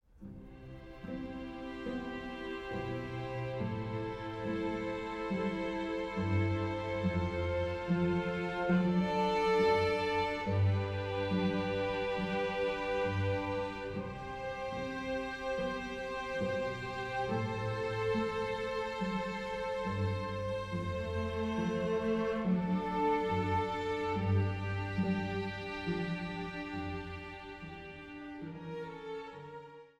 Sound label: piano